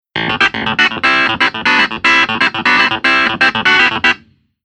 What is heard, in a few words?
Keyboard Clavi Tone